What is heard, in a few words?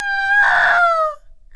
kill chicken